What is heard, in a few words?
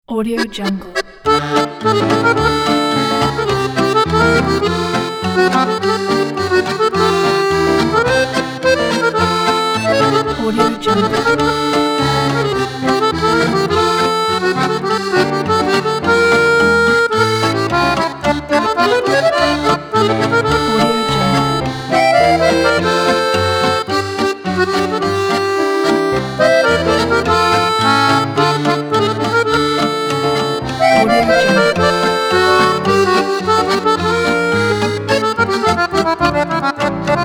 accordion gypsy jazz